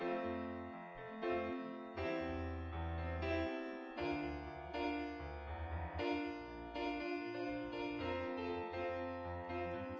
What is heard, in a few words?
nodrums inst